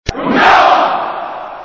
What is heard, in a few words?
City Drill